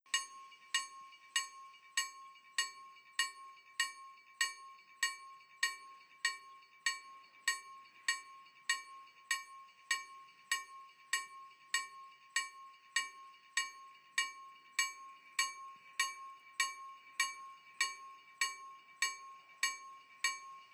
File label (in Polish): dzwonek; OpenBVE; LK271